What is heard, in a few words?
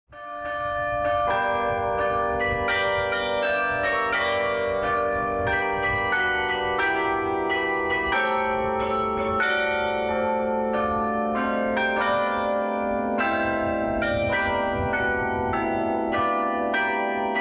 Carillon